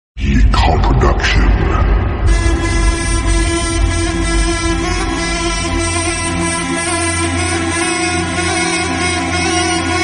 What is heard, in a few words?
turkish mafia music remix